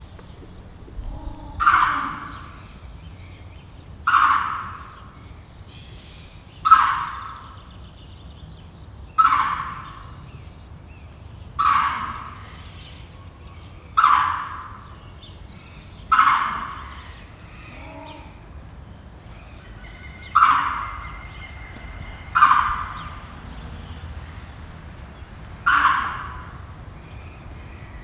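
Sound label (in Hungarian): continuous shrieking; aranyhasu mangabe; debreceni zoo 2012; marantz puska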